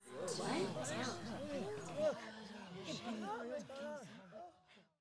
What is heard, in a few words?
crowd Chatter guild; spellbound